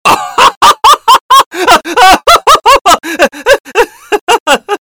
laugh; scout; fortnite emotes

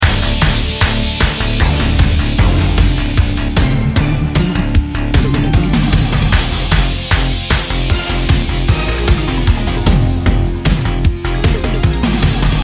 emote aerobics; fortnite emotes

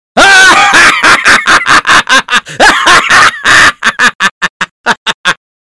laugh; spy; fortnite emotes

